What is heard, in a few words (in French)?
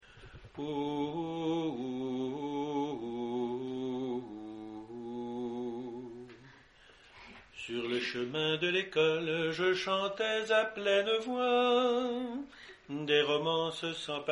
alto